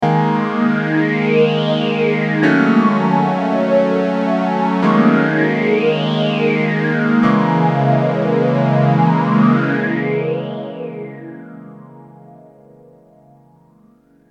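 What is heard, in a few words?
Yamaha phaser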